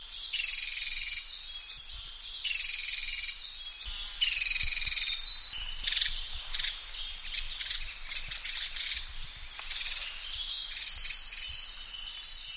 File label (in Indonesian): caladi sulawesi